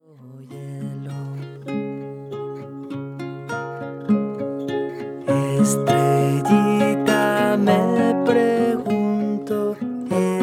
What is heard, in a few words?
twinkle twinkle little star; Estrellita en el cielo